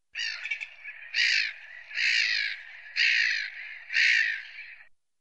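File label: ozernaya chayka golos, black-headed gull voice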